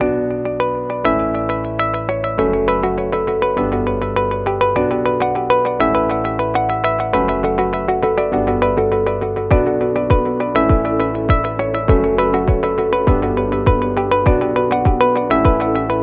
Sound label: Relaxing